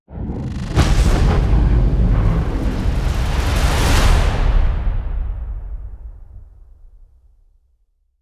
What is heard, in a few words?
Stinger Modulate ramp